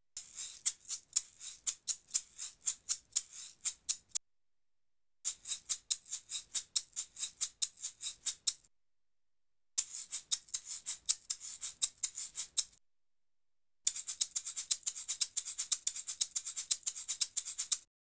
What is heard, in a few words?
Salsa Picante; Masterbits; World Zone VOL-3